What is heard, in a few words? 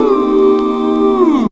tuners